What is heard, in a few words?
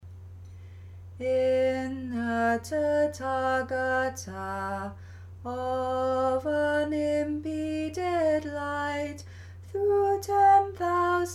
Chant; In the Tathagatha